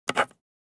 Ballpoint pen underlining on paper

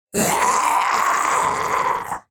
Demon beast imp monster growl grunt

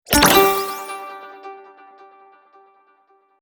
Complex tonal notification

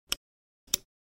Mouse drag and drop click

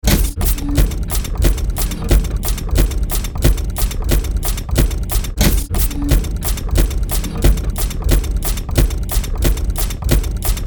Steampunk heavy machinery automated loop

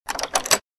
Keys put in lock and opening

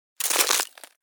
Single step on cracking ice

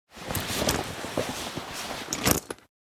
Military uniform cloth movement; Military Equipment

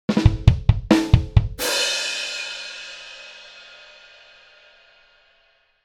Comedy drum riff

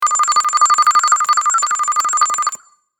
Futuristic text generation short

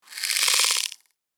Insectoid creature alien attack

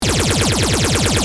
Sci fi weapon machine gun bursting loopable

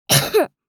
Little girl heavy cough; gfxsounds

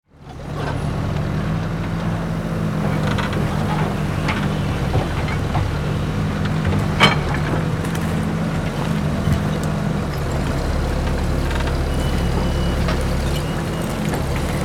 Excavator construction vehicle digging

